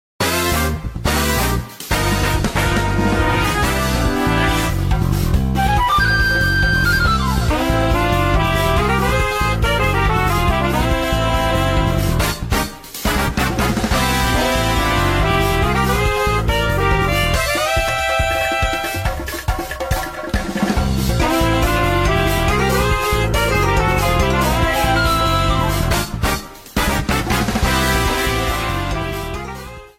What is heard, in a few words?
Rush Theme; MKT; Bangkok